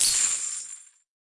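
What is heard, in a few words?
Goblin Base, UI音效; 哥布林; 哥布林会用匕首不断刺向对方; 部落冲突; 皇室战争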